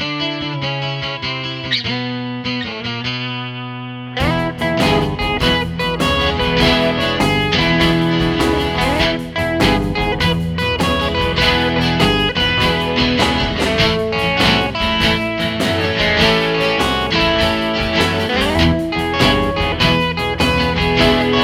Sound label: Acoustic Shuffle